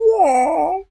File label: Bad Hit Sound; Beat Saber Miss Sounds; CrashBandicoot